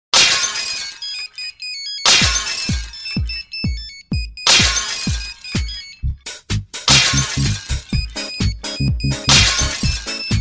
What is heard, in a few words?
Nokia glass mix